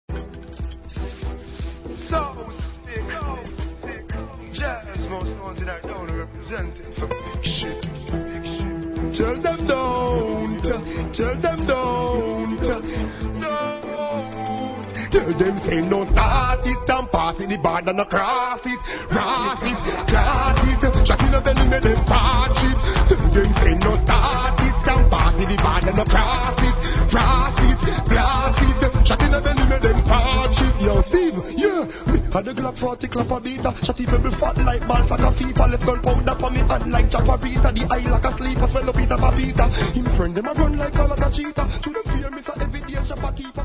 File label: riddim